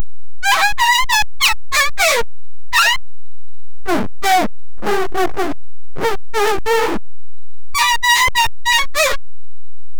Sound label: girl burping while puking